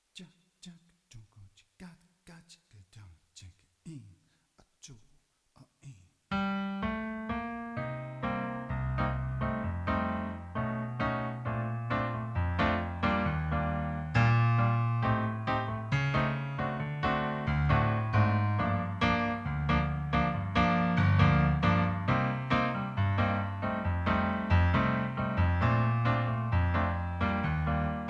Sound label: inst bossa; Autumn - Inst. bossa M